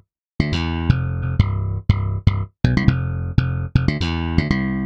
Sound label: bass